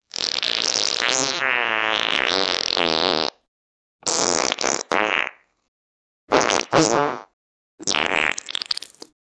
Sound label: wet farts while pooping